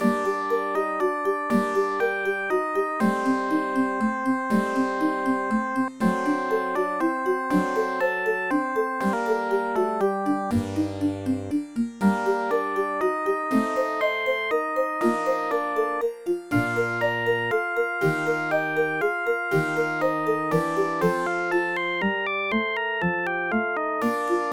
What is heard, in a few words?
Siciliano, Flute Sonata in E-flat major; Johann Sebastian Bach; AdLib music synthesizer card demo songs; IBM PC XT AT